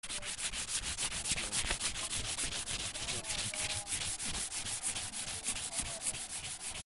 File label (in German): schleifpapier